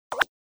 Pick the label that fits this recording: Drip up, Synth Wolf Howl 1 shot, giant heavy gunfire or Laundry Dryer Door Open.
Drip up